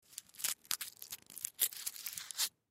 Candy Wrapper, Candy Wrapper Open Tin Foil Crumple; Food Chocolate Small; Food Sound Effects; Foley